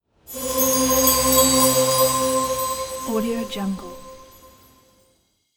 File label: empire game settlement alert wonder discovered heavenly choir; shimmer